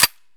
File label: mpx bolt in fast; Escape from Tarkov